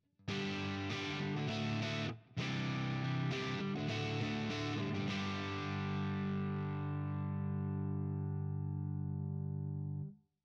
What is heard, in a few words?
ToneX Pedal JCM 800